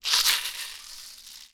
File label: box rattle; Kenya Box Rattle